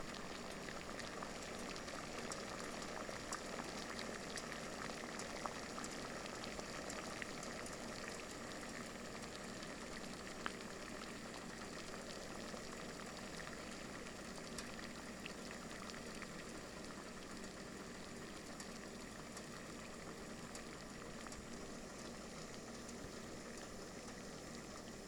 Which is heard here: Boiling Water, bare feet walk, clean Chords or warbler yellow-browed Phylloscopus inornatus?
Boiling Water